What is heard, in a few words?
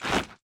movement step; smite; odin